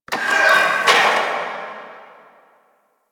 Gas Machine; Ambience; Warframe; Corpus Gas City

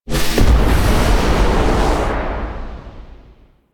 Void Dragon Floor Lava Pillar Explosion; Enemies; Warframe; Duviri

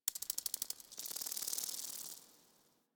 Insect Night; Warframe; Ambience; Eidolon Plains; One Offs

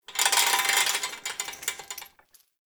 Cage Rattle, Hanging Cages; Puzzles

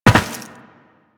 Land Metal, Footsteps; Warframe; Tenno

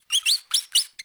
Exterior Forest Critter

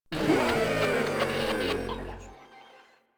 Proto Infested Runner Death; Warframe

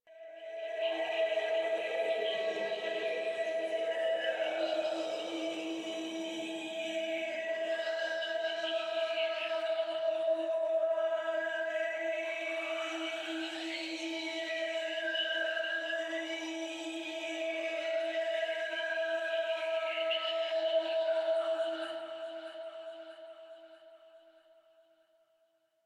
Choir; Warframe; DE; Sentients

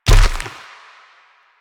Proto Infested Runner Step Default; Warframe

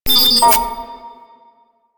flying robot beep; Warframe; Quests; Veilbreaker; Ambience; Kahl Quest

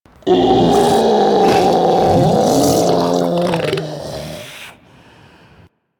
Idle, Infested; Enemies; Barks; Warframe; Ancient Shell Agent

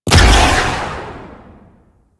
Artillery Barrage Explosion; Weapons; Powers; Warframe; Archwing

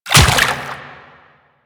Step Water, Footsteps; Warframe; Quests; The New War; Kahl

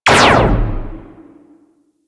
Generic Laser Fire Distant Space A; Warframe; Ambience; Transitional

